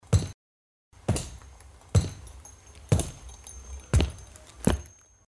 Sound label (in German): Schritte cowboy